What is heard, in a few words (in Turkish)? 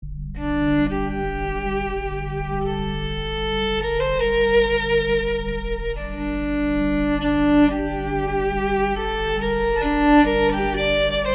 keman zil sesi; cep telefonuna keman sesleri